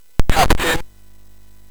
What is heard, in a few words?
Jump Jet, sid MOS6581R4; hvsc